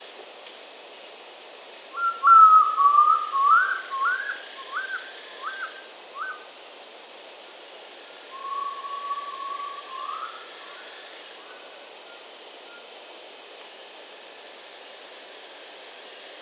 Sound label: Phodilus badius, Bruineuil; uilen; Tytonidae